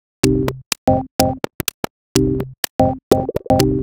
125bpm, House Drumloops

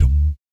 BS DMM, Vocal Planet Jazz & FX; ILIO; BASS DUMMS

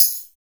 TECHNO TAMB, TAMBOURIN

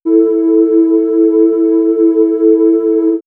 Swirls Ambient Drone, pads; X-Static Goldmine

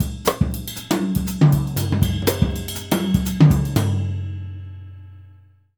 Jazzistic; Univers Sons

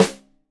Rim Shot Stereo, drums; Deeply Sampled Drums; SMDrum

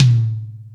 Rock N Roll, Drum Machines

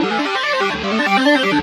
Arp, Keyboards

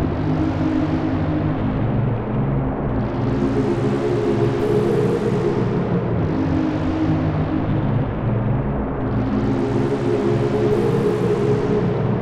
hard freeze, Loops; Polyend; Loops n trouble

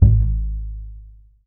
TUB BASS G#1; Country

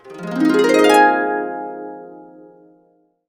Complete Harps; USB Soundscan vol.62; AKAI

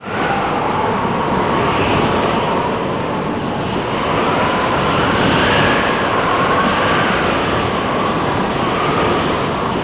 Wind; Best Service Advanced Media Trax Building Blocks; COUNTRY

